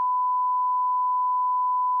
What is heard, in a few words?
Censor Beep